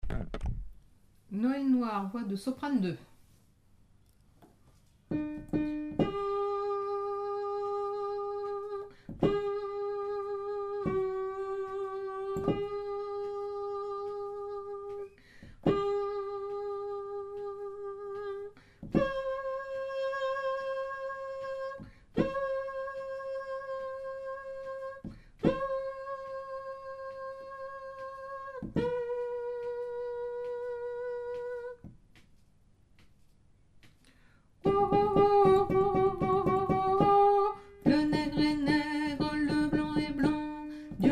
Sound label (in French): noel noir soprano